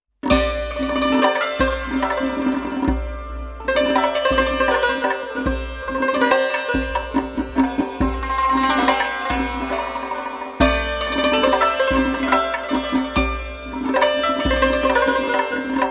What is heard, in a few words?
Santur Zarb